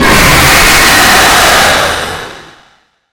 screamer; phantom mallie

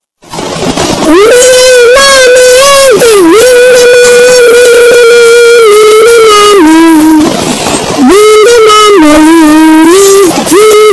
loud jingle; kentucky

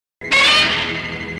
roar; godzilla 1964

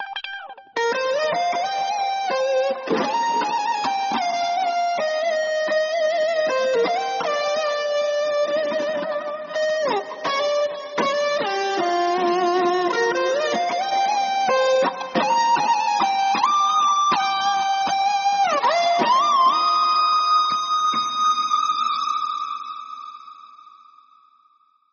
electric guitar

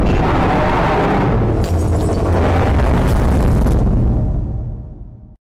speaker calling; titan

